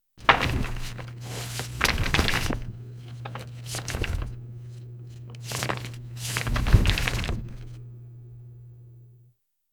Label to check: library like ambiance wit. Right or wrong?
Right